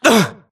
grunt hits; Titanfall 2

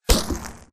imp bullet lightballistic impactsweetener; Titanfall 2

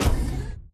footstep jog close; Titanfall 2

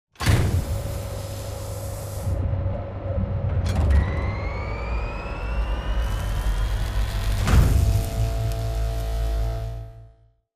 control room power up; Titanfall 2

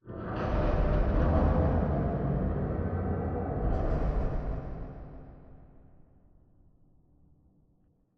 Robot Arm Final Station Up Dist; Boomtown; Titanfall 2

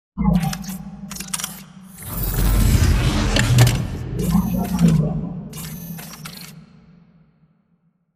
pipe idle reverb; bt; beacon; control room; Titanfall 2

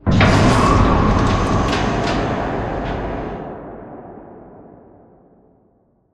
Giant Floor Panel Arrive Start; Boomtown; Sideways Town; Titanfall 2

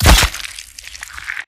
Chestpunch Impact; Titanfall 2

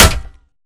Imp Bullet Light Ballistic Solid Metal; Titanfall 2

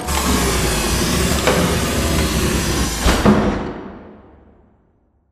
Assembly Plate Lift Lower Metal; Boomtown; Titanfall 2

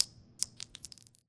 9mm shell drop; Titanfall 2

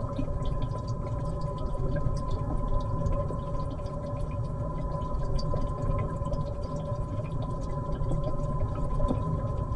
robot cylinder; Titanfall 2

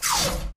bullet generic titan shield scatter; Titanfall 2

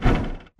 bt footstep jog parts; Titanfall 2

